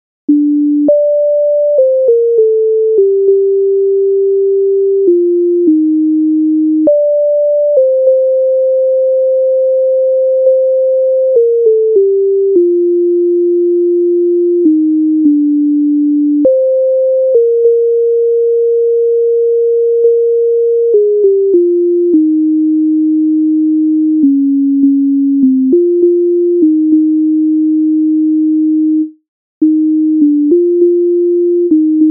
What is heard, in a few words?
MIDI